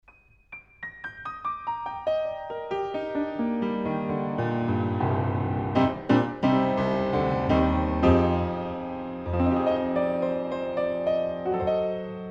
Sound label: Piano, 60cm Omni Pair Lid Off